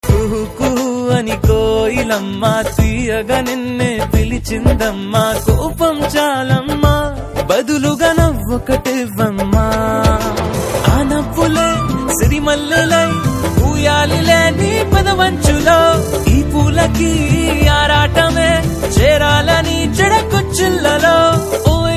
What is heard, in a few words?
Ringtone